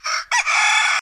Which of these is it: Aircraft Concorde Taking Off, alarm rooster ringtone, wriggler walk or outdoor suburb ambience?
alarm rooster ringtone